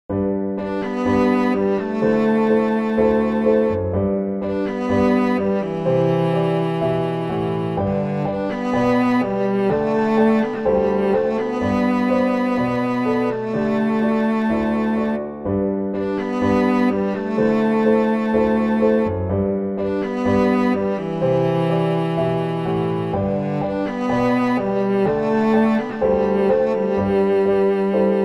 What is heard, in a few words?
Cello